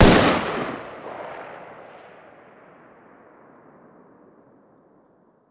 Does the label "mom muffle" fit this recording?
No